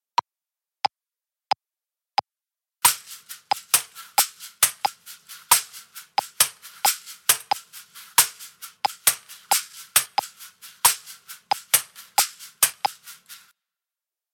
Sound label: Caxixi; shakers